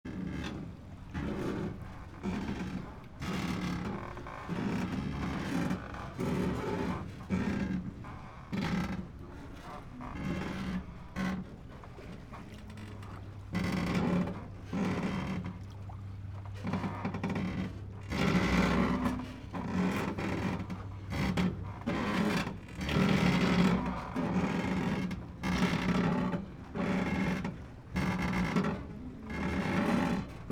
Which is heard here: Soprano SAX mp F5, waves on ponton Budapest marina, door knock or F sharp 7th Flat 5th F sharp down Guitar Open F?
waves on ponton Budapest marina